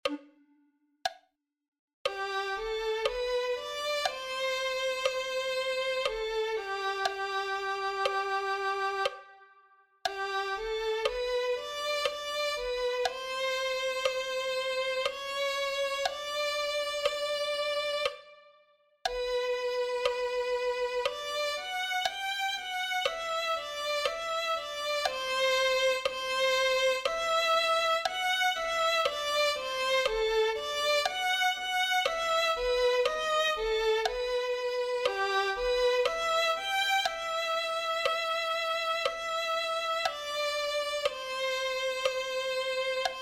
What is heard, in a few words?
violin